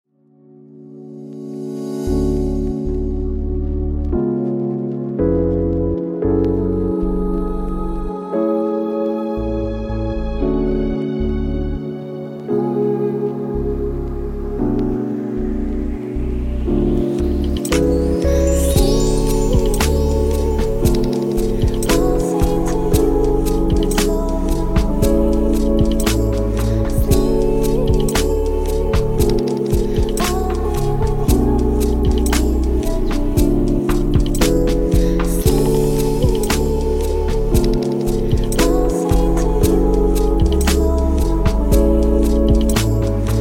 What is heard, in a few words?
Sleep